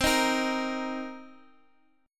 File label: C Minor 7th, C, guitar chord